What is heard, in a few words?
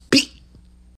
beeetttt